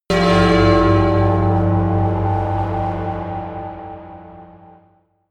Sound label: melodic church bell pad low